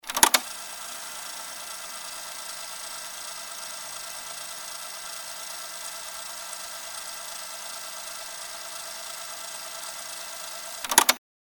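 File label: Cassette being rewound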